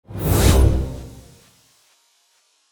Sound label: start